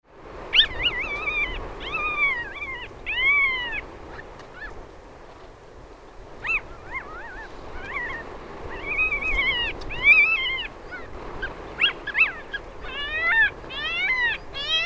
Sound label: long tailed skua